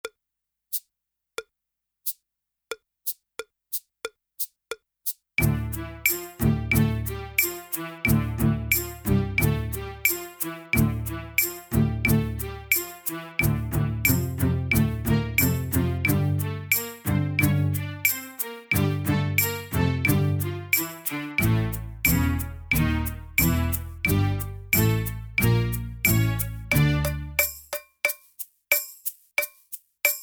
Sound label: backing, drumming; Creative Drumming Made Simple